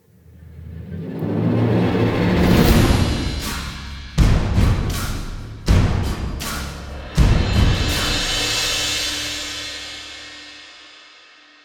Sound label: General Combat Transition Layer; The Witcher 3 Wild Hunt; Ard Skellig